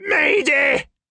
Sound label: Gyro death